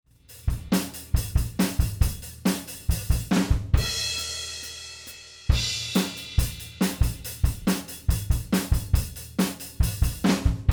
Bubny HP; high pass filter

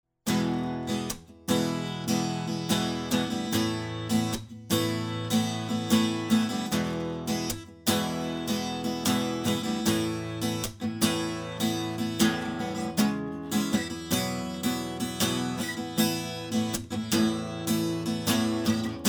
Akustika Trsátko AKG 440

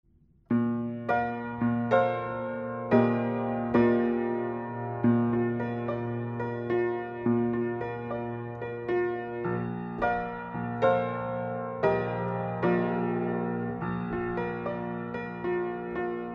Pianino; Voodo VR 2 Active

